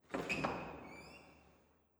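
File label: ambience generic sounds; Lucius III; pc linux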